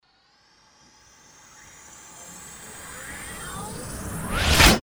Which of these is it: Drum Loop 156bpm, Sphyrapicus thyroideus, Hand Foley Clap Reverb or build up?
build up